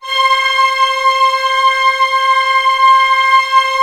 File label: ORC C5 FF, Orchestra; Sound On Sound - Peter Siedleczek's Orchestra